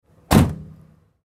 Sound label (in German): Tuer Lieferwagen